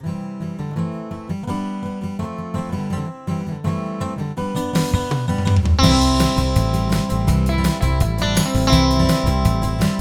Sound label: midi